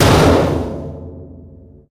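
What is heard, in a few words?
panels impact large, metal; physics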